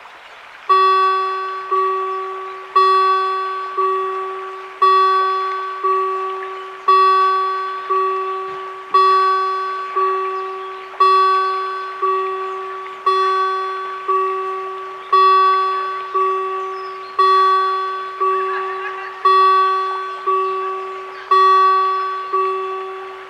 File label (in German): Signalton Bahnübergang Modern